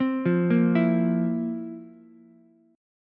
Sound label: F Major 7th Suspended 4th F, Ukulele; FM7sus4 arpeggiated; JGuitar